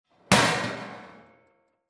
TOOLBOX

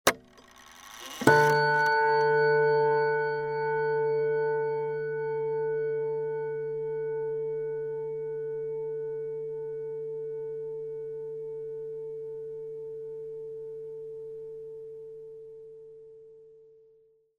CLOCK MANTLE

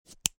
CLASP PLASTIC

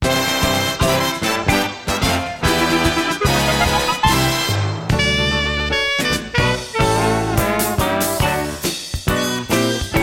TV Toons

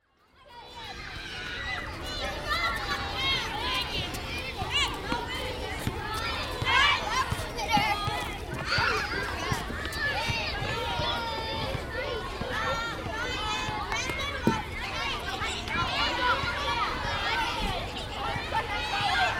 SCHOOL ELEMENTARY AMBIENCE

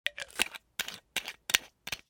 BOTTLE GLASS

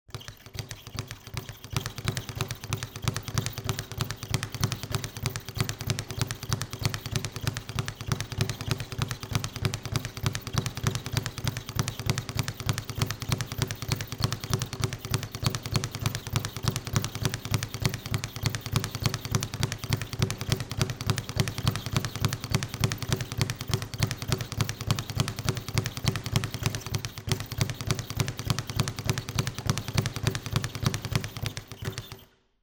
BOXING GYMNASIUM

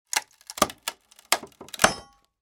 CRATE WOOD

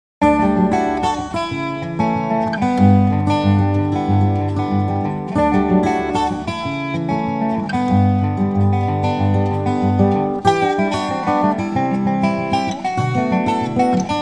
Guitar and Dobro

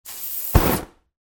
FIRE CAN BLAST

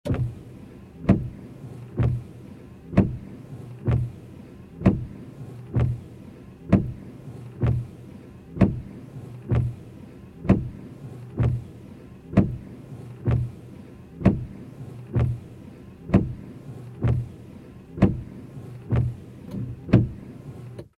Auto Windshield Wipers Compact Interior Slow

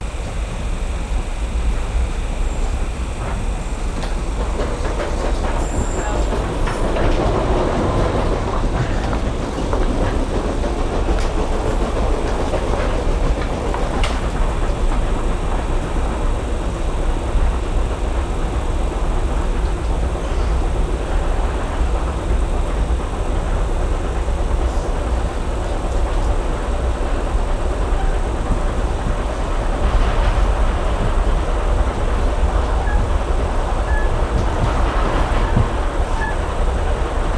Train Terminal Go Stop Go Interior

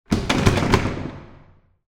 DOOR METAL

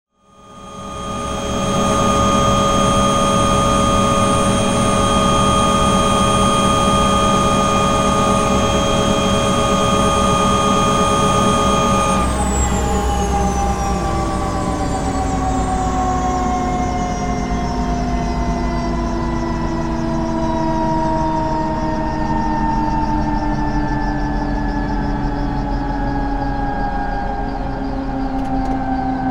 HELICOPTER

